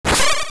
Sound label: Cane Of Pacci Shoot; Shooting with the Cane of Pacci